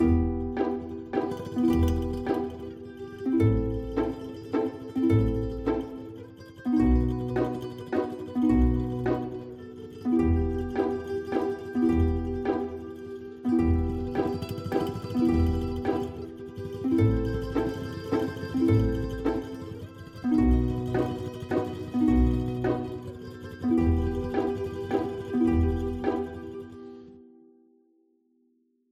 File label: Guitar; Oliveira Layer B; tintin reporter cigars of the pharaoh